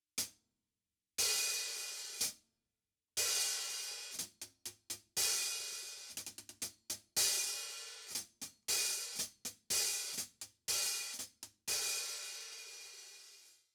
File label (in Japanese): ドラム サウンド HH